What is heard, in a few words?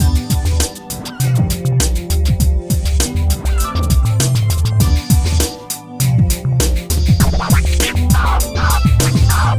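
Mission Result Fail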